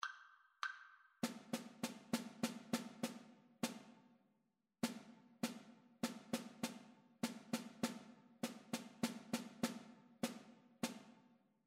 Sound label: rhythmic dictation; ear training